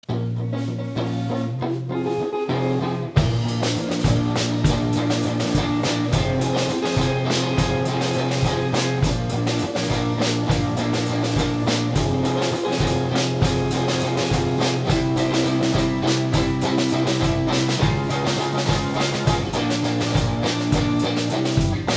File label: Instrumental